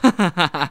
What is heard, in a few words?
Hahaha; asdfmovie